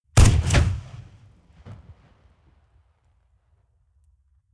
rifle ak-47 exterior fire single bass; comedy-cartoon; Pistols and guns; pistolen-gewehre

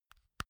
insert usb c slow, elektronik; objekte

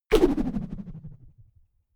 whoosh rubber band vibrate; swooshes-swishes

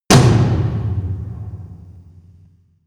huge tom hits; music elements; percussion

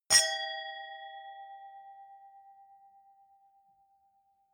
wine glass different clink bounce medium; berufe; gastronomie; soundpool

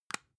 spice jar close short; industrie wirtschaft; gastronomie

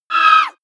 wraith attack short; schreie

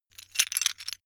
metal pieces clatter short

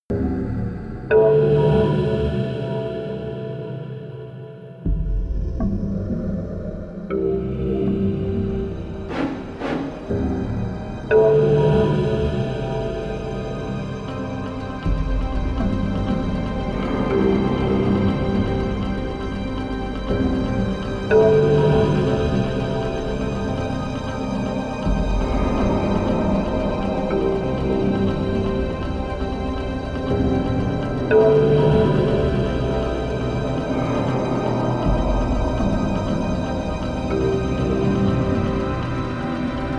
drone mysterious hits

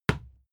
fight impact sweetener cloth; nahkampf; soundpool

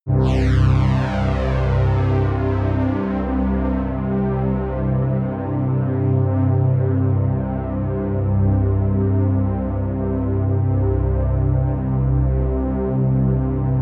pad sweep flanger; flaechen

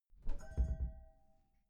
glass door close soft glass clang

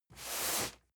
cardboard box medium slide; objekte

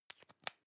lipstick close soft; haus-garten; badezimmer

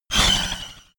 sci-fi laser shot down; soundpool

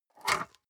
storage lid slide open medium, box; objekte

